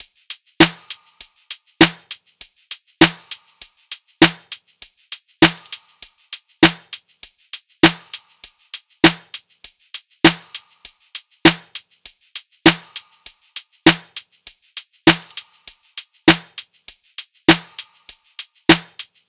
tops 100, Drum Sample Pack Loops Top Loops; SD SEISMIC; Slate

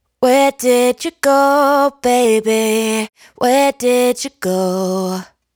hook 150 Bm, Dry Vocals; Raw Vocals; Where Did You Go; Slate Digital Omnivox Vocal Sample Pack

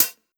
Closed Hat, 909; Slate; Vintage Drum Machines Volume 3 909 Edition; 909 Closed Hats

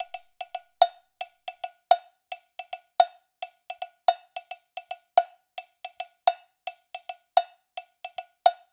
cowbell loop, 110

